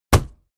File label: Axe Paneling 2 Side Du, axe hits